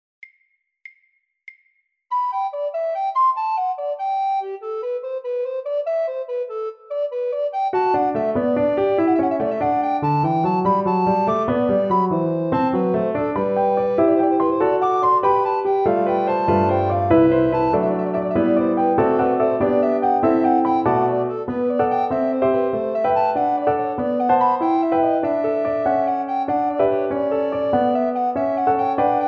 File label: Recorder; Murril